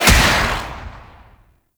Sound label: Roar Attack Pulse; Warframe; Zealoid Prelate